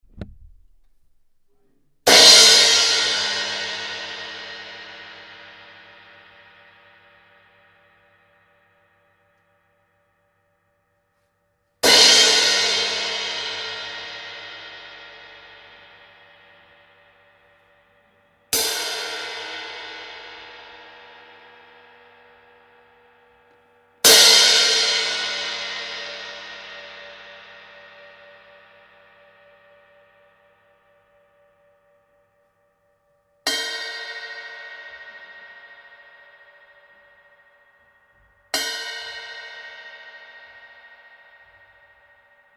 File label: Crash 16; Taurus; Amedia